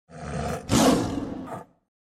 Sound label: roar